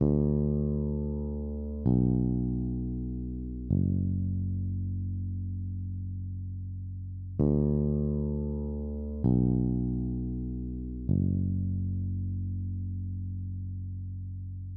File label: Sad Melody Loop - 130 BPM F# Min Bass; Cymatics; Oracle Sample Pack